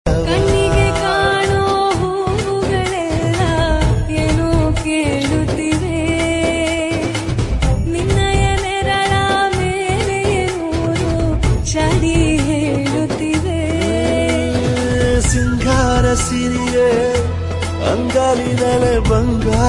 Tamil Ringtone

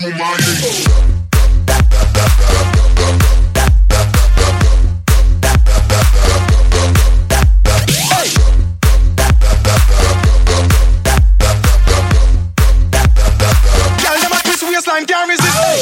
Ringtone For Mobile

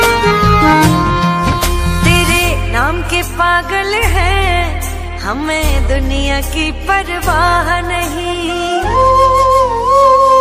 Bhajan Ringtone

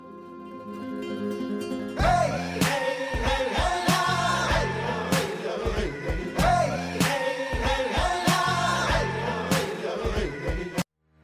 Ringtone; Dream11

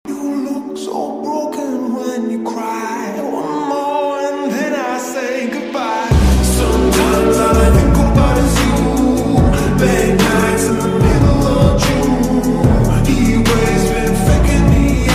English Ringtone

